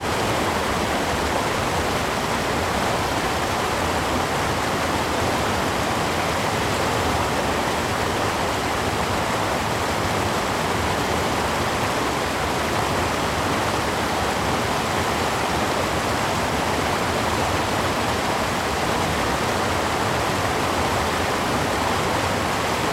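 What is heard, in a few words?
water weir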